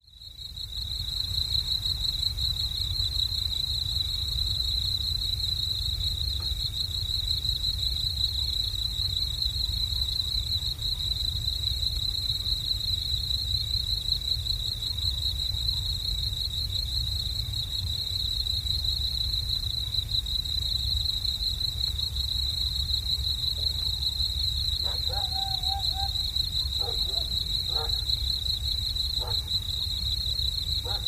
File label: Crickets Night Country